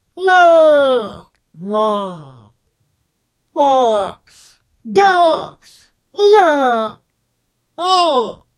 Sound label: disapproval and astonishm, expressions